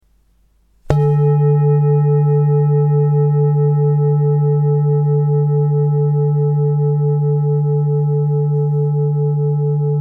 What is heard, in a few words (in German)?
Klangschale